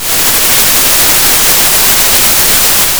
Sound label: pop, Sound of a thunderstorm